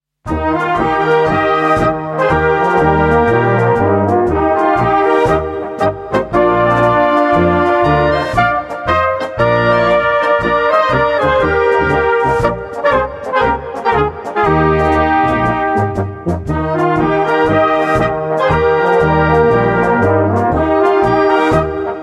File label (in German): hisli polka